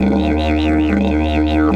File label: 140, DIDGE RIFFS